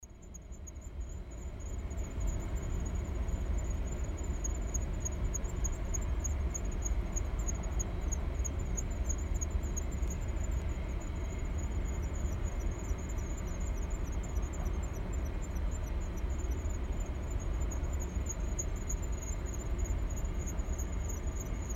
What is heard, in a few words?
crickets